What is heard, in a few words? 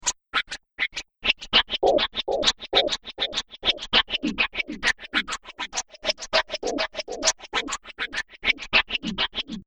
Future Dj The Scratch Loop, music loop; Percussive And Rhythmic; Seamless loop